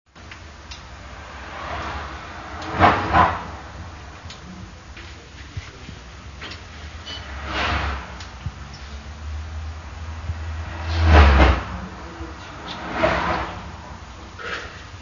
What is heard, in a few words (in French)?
auto passage plaque egout